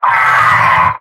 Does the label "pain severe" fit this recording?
Yes